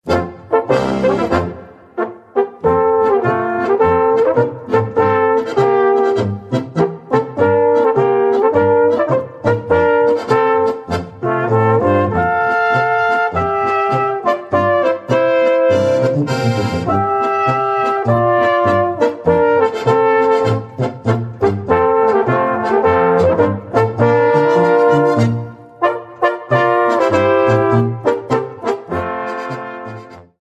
marsch

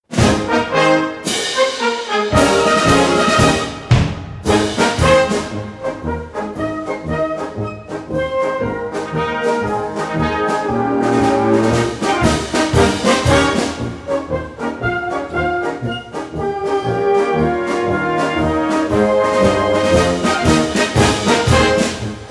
marsch

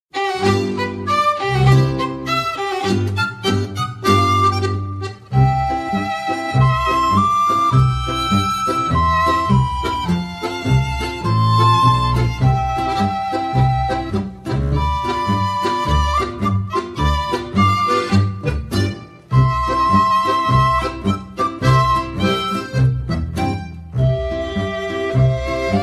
vogelpolka

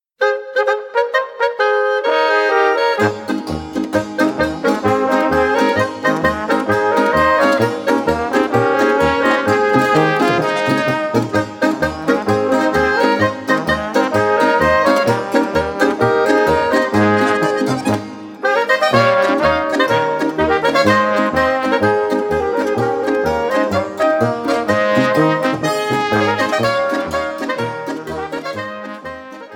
polka

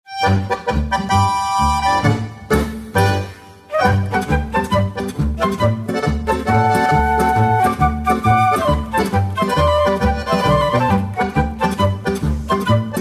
schnellpolka

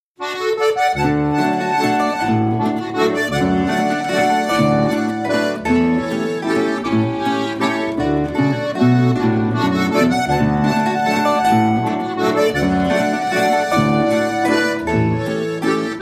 schwanthalerhoeher halbwalzer instrumental

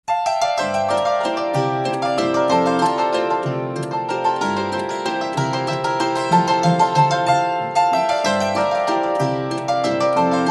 alte pongauer landler

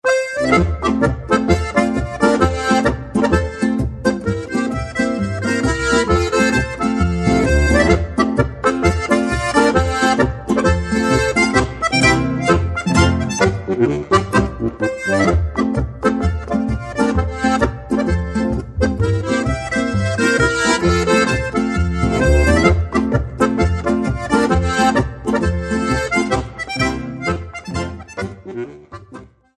polka

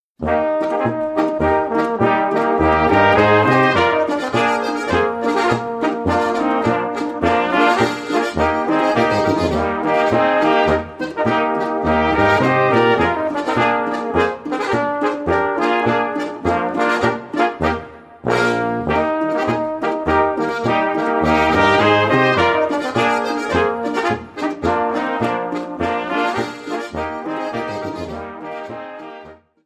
polka

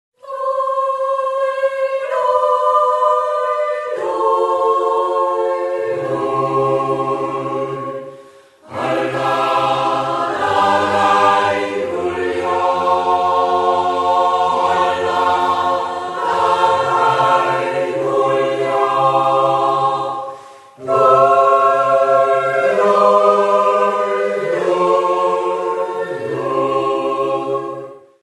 jodler

